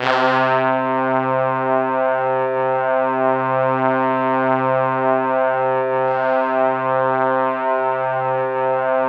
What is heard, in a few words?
C3, Synth Line; 90 s Sample CDs; NiceBeats; SynthLine VOL-24